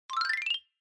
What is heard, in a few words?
Xylophone Fast Long 3 Up